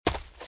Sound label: Footsteps - Leaves - Run - Right; RadwinIsland